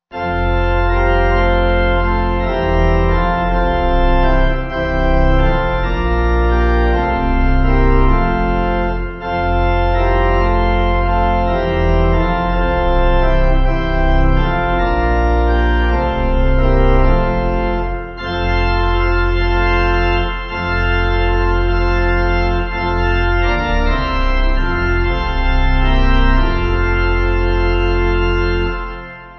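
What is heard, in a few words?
Pipe Organ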